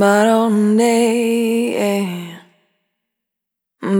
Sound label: Spin, Single Voice Melodic Loops